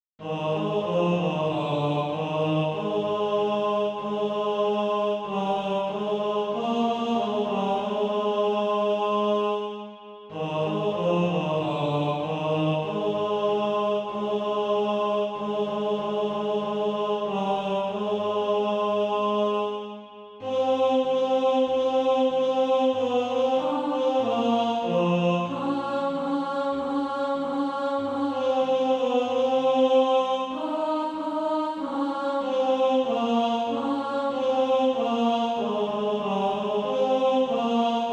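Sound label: tenor, SATB Practice Music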